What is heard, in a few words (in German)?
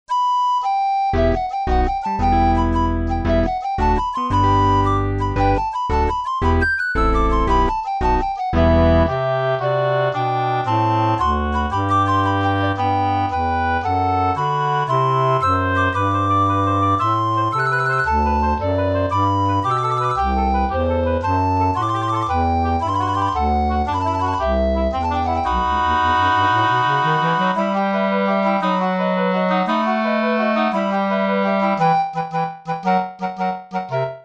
vorspiel